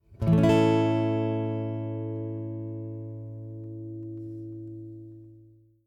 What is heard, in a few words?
A Major Bar Chord, guitar